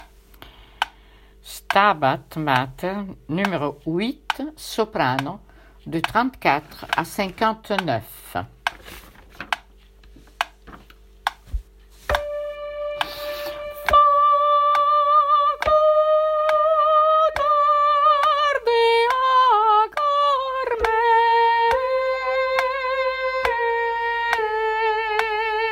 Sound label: soprano; Stabat Mater 8